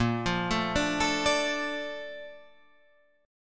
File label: Bb Major 7th, Guitar, Drop D